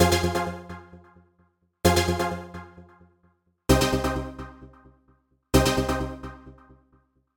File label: Minor Chord Stabs Pattern; echolab